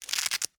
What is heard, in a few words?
Industry Tool Velcro Ripping